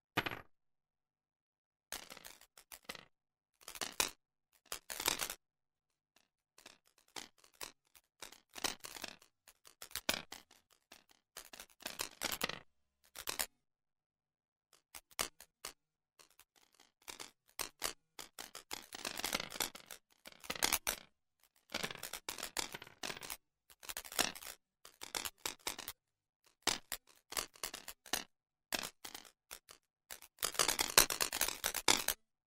screw ant

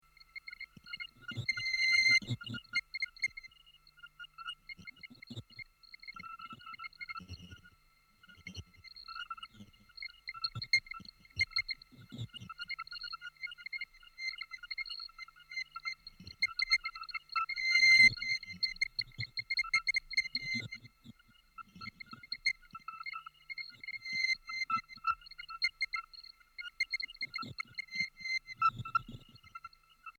reverse squeaks

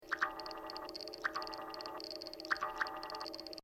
droplet + car start

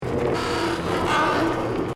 Duck quack

